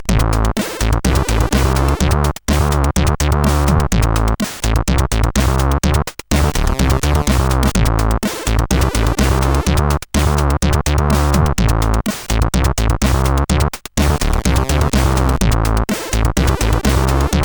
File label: Jingles, sid CSG8580R5; Deek